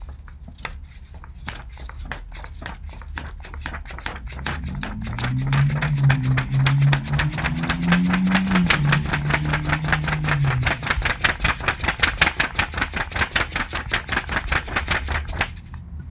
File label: sound fan